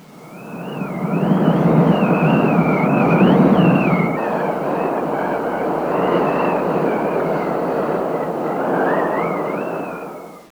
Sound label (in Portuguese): Ventania; Vasco da Gama